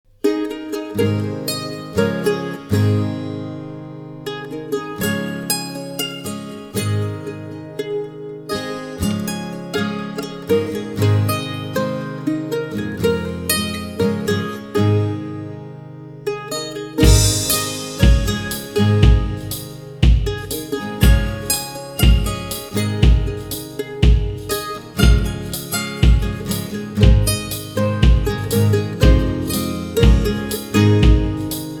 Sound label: CHARANGO STOMP